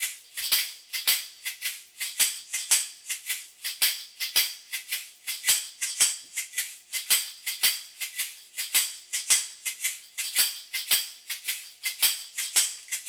perc loop miami shaker tambo 3 4 time

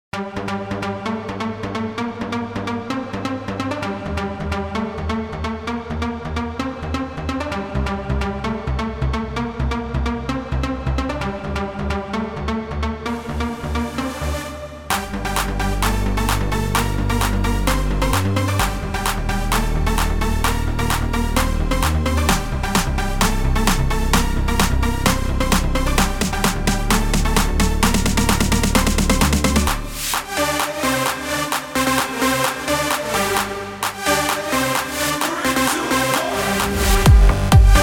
dj; pa 700

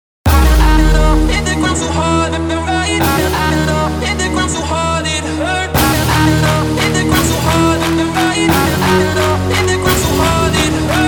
vocal hard style; purim

